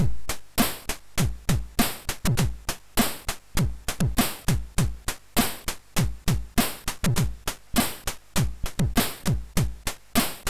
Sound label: Beat, MOS6581R2; Mind Over Matter